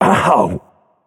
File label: ouch; smite; arthur